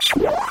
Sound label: robot